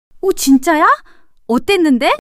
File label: surprise